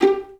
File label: VI PIZZ G3; Best Service; Peter Siedlaczek's Advanced Orchestra